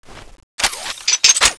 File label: wing gun clip in